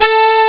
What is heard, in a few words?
bright brass, instruments; sonix; Rise Of The Dragon; chipmusic